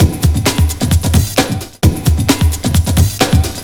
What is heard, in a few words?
LOOP; SoundFactory; DRUMLOOP